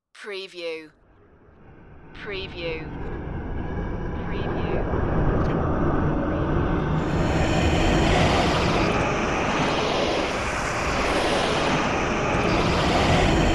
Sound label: space turbulence swooshes